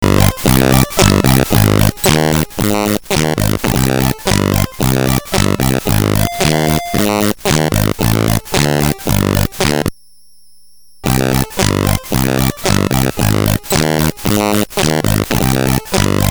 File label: fpga farts